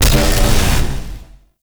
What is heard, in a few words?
Void Dragon Body Ring Impact; Enemies; Abilities; Warframe; Duviri